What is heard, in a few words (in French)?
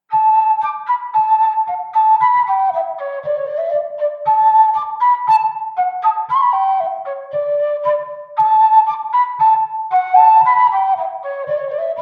Bourree; Recueil De Raoulx